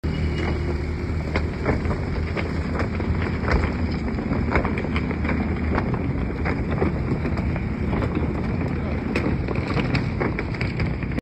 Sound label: lotus evija, اگزوز; لوتوس اوایا